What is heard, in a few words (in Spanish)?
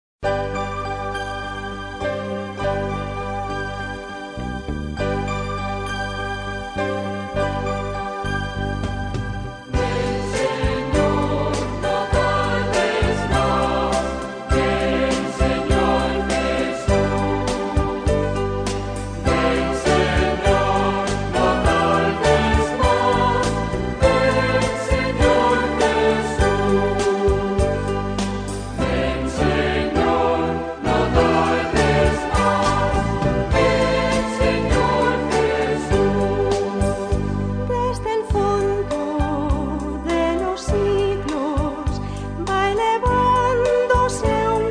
Adviento, Canto de entrada; Cantos de Entrada y Comunion